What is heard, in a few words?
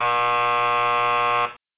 buzzer, basketball